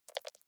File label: click erratic wide